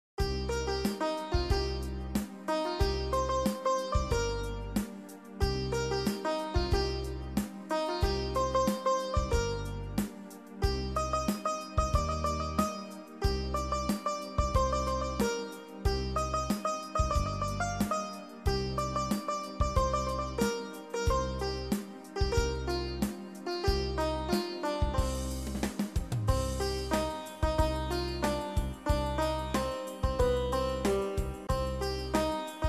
song keyboard cover

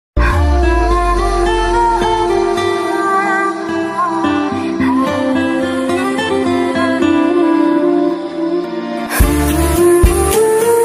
background music instrumental ringtone; m s dhoni

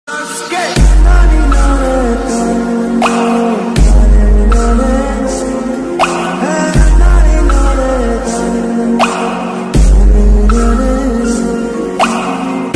water drop remix mass ringtone; mother bgm; kgf

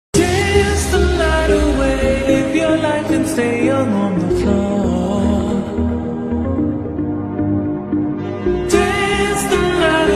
ringtone slowed

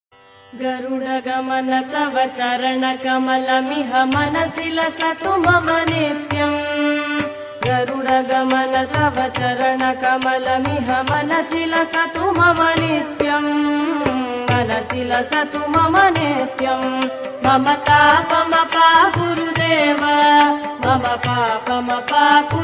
song ringtone devotional ringtones

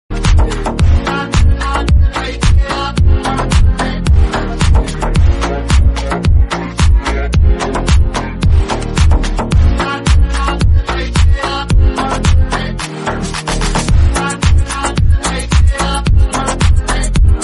bad boy ringtone, attitude ringtone

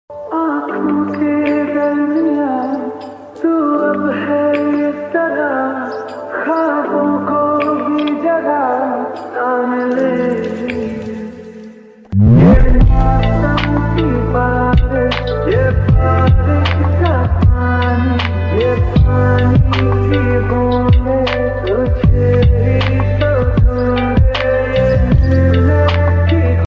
hindi ringtone song

